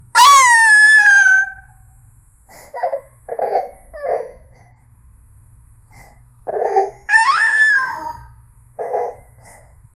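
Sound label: a playful 3 year old child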